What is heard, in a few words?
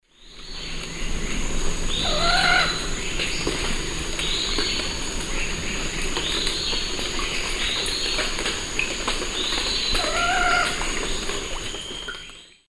Orthopsitta manilata, calls 3 birds; S. America; Eastern Lowlands